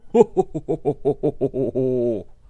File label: Jolly Laugh